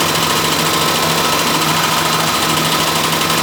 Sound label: Mining Machine Bit Loop; Warframe; Ambience; Grineer